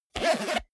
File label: zipper small case close fast